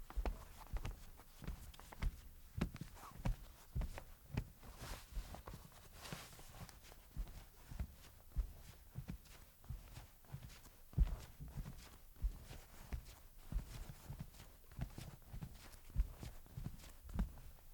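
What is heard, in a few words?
Footsteps On Linoleum Floor With Socks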